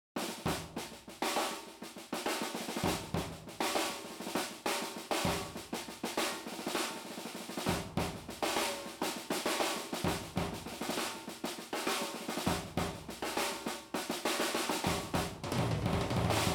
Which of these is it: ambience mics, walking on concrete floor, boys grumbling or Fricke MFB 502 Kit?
ambience mics